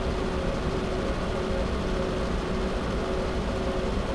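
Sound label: elevator exterior loop; doors elevators; Star Wars Obi-Wan; XBOX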